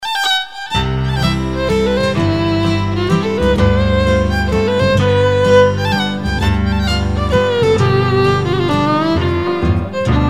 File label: Waltz